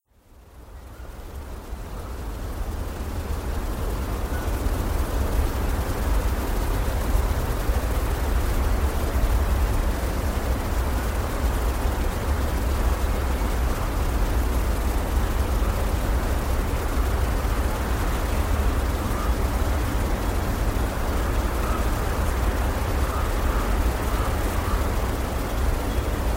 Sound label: Forest Creek; Mudita Pure; bell; bg sounds